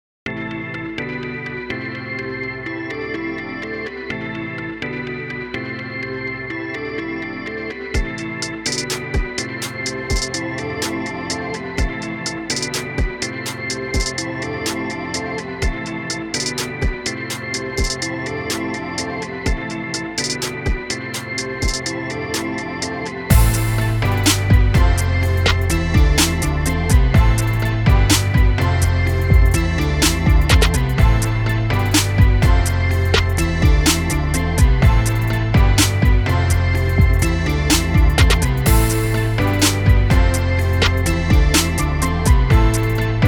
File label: music